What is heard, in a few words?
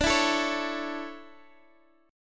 D Major 7th Suspended 2nd, Guitar, DADGAD